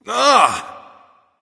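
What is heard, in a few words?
non-verbal ugh; smite; voicepack; houyi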